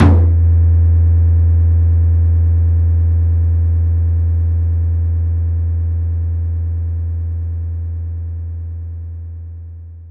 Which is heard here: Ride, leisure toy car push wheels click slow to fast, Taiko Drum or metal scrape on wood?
Taiko Drum